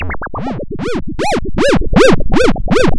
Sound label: pop, Sound of a duck